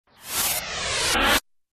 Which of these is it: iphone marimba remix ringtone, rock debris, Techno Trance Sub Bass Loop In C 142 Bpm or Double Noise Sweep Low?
Double Noise Sweep Low